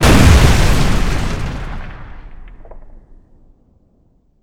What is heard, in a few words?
Explosion; Sample Audio Pack; Free Pack